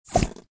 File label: GUI stickerbook delete